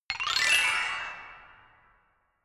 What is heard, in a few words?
notification glockenspiel horror slide up; instrumente